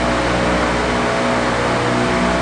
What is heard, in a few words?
Wellhead Device